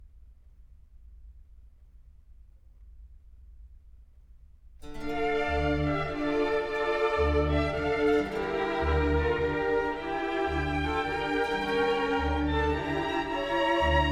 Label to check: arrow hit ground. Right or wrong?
Wrong